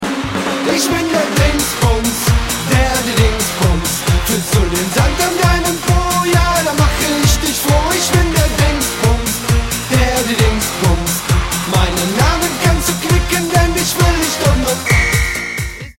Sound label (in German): Klingelton